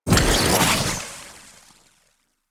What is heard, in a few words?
Shrimp Lantern Weapon Fire; Creatures; Baliq